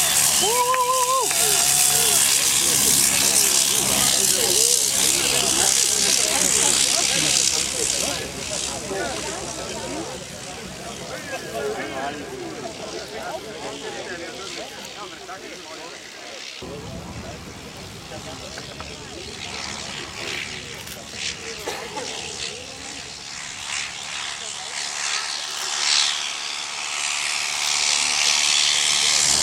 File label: Bleriot XI